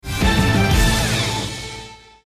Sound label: start game